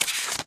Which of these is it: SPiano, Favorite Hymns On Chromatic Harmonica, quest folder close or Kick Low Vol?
quest folder close